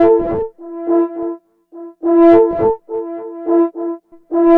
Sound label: French Horn; Monkey Funk